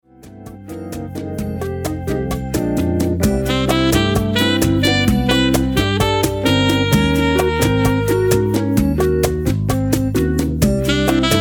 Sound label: Rumba quartet; Conchus